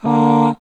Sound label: TALKBOX